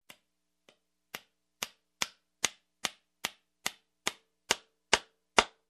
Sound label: Hammering a nail into a wood